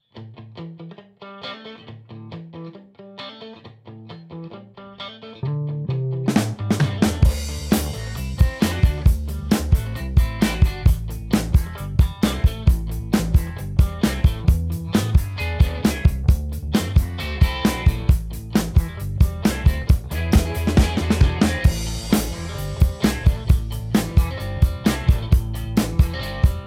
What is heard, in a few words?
funky track; headphone mix